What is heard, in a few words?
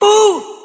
"ooh" male